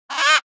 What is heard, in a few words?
animals bird parrot black cockatoo squawk single; zapsplat